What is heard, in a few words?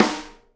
SNARE RIM, Live Snares